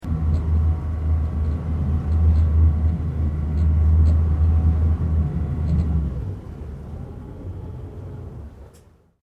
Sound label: MACHINE INTERIOR MOVING; Industrial machines; Machinery Sound Effects; Machinery (Sound Effects:Machines)